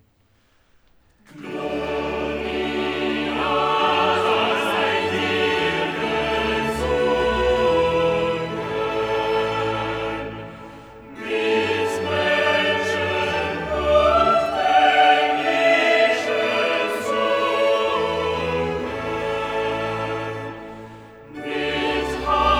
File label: Cantata, Choral; BWV 140 'Wachet auf, ruft uns die Stimme'; VII. Choral 'Gloria sei dir gesungen'; Bach; Winchester; 23rd Sun After Trinity